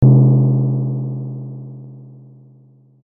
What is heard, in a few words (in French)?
timbale